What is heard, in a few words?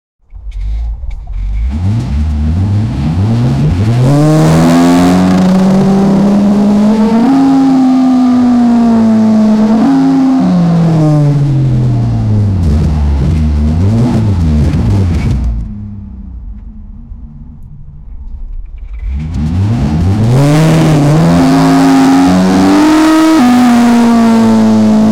motor v8, intake